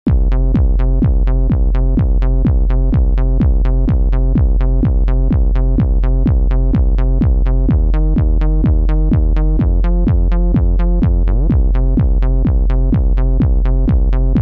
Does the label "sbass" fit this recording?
Yes